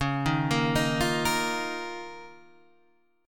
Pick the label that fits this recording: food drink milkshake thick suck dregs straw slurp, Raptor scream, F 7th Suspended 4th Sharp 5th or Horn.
F 7th Suspended 4th Sharp 5th